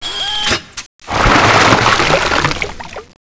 megalodon attack bomb close; Boss Megalodon

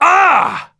human die; wolfteam

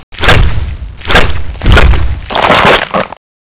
megalodon ground attack; Boss Megalodon

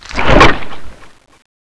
chopper bite; zs rendezvous

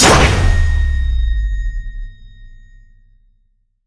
sword bombard fly last

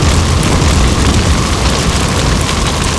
fire gas loop; zs rendezvous

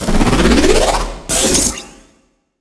burst discharge adv reload, weapons

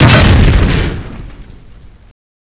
explosion machine; zs rendezvous

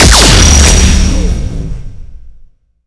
burst discharge, weapons

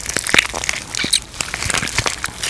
bomb run; Boss Megalodon

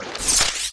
burst discharge adv draw, weapons

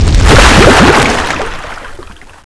megalodon sliding end; Boss Megalodon

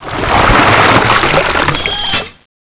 megalodon attack bomb open; Boss Megalodon

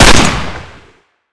chain pistol; weapons; chain sr

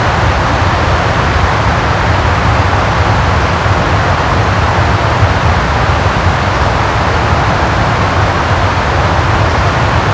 waterfall loop; zs trace

